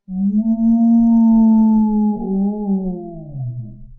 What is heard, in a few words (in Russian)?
skvoznyak voet v dveri